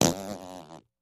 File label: Close Up Fart; farts